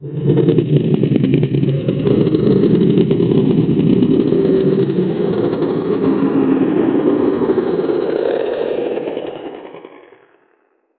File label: make cartoonish gravity s